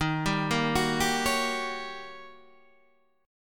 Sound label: D sharp 7th Sharp 9th Guitar Standard; arpeggiated; JGuitar